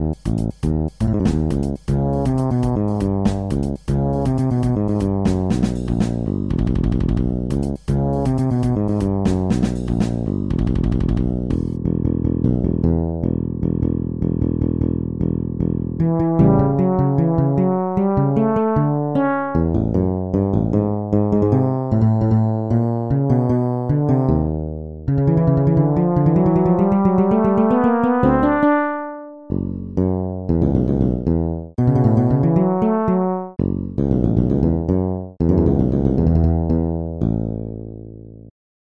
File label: Fretless Electric Bass; Bassesland; Virtual Acoustic, Electric and Synth Bass KONTAKT Sample Library for NI KONTAKT; Syntheway